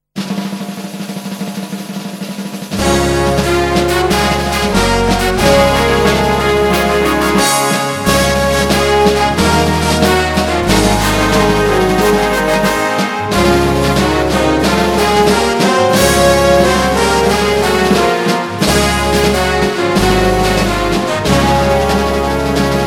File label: National Anthem Instrumental, pakistan